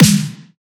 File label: snare; Drum One Shots; dweeb; SD MIDNIGHT Sample Pack; Slate